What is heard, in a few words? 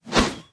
pipe swing; smite; xbalanque